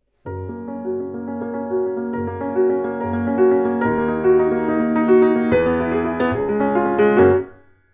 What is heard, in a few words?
tom jingle